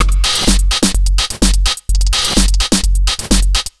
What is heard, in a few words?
127 BEAT, Fresh Disco House; USB Soundscan vol.20; AKAI